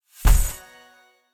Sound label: cash out sound